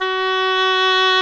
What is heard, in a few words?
OBOE; Roland - LA Composer vol. 1